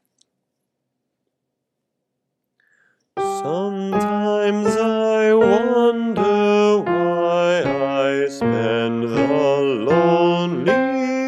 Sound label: Stardust Low with Voice